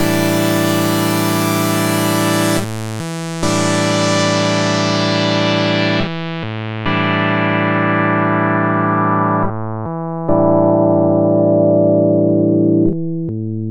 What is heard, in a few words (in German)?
Voice Calibration Aus; TAL-Pha